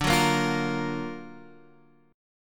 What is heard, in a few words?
Gb Augmented Major 7th D